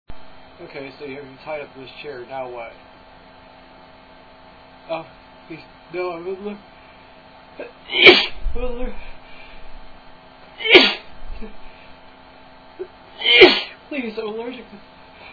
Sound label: torture sneezes, male